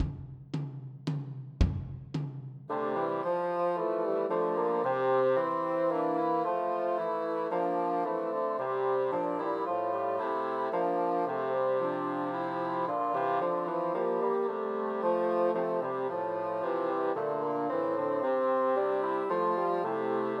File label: The First Nowell Christmas Trios for Three Bassoons